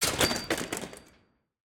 Bicycles, Fall, Indoor; lilesound

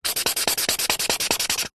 office pen felt marker fast scribble on paper

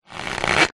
cartoon squeeze tight gap; zapsplat

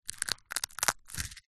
food crackers squash crack break crush in hand; zapsplat

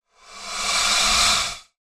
animals alligator hiss

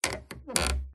Stress Creak Squeak Groan; PMSFX

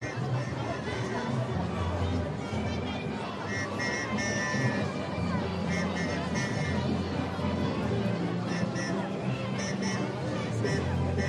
atmos protest band approaching

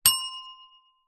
bell small reception desk bell single ring

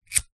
household cigarette lighter light; zapsplat

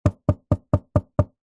foley wood knocking fist several times; zapsplat

